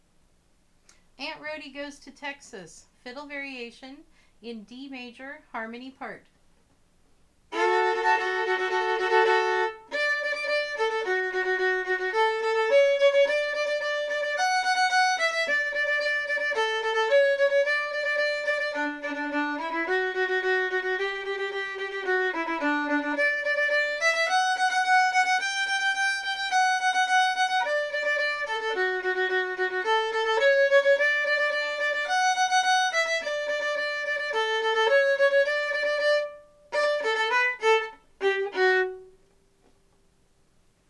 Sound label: Aunt Rhody Goes to Texas in D Major Harmony